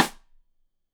Side Stick Stereo; Deeply Sampled Drums

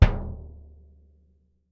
BD M, Percussion; Miroslav Vitous - Percussion & Harp

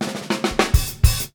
Fills, Monster Beats; Zero-G

